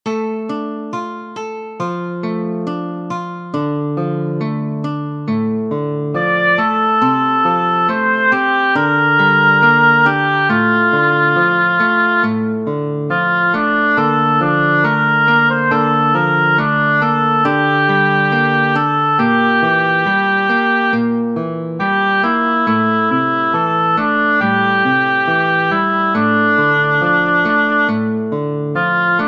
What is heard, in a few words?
oboe